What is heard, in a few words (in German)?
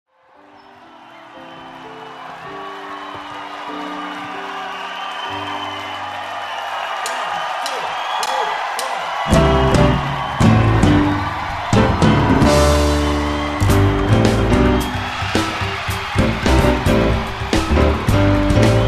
Hymne